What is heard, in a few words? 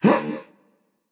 breath, voicepack; smite; jorm